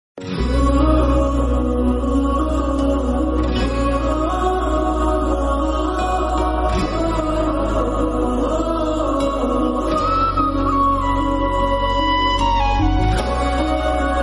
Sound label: sad emotional instrumental; fidaa